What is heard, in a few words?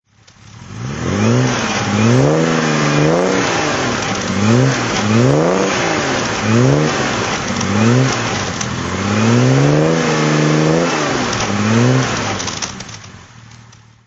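aston db6 vantage throttle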